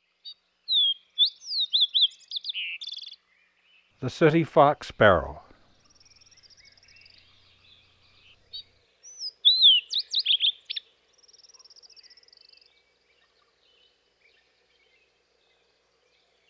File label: Sooty Fox Sparrow; Western North America; Dan Gibson; Wildlife Identification By Sound